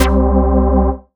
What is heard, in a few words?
Bass C, Offbeat Bass; vengeance essential club sounds-3